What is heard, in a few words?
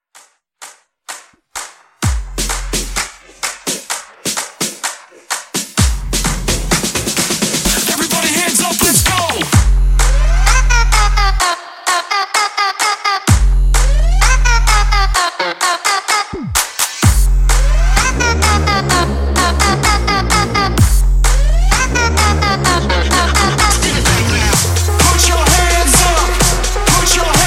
Bass Bounce Clap; Remixfreakz